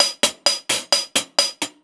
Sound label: Fill, Drums, Eclectic Electronica